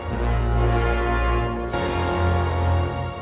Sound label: horns, Loops